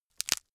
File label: crack knuckles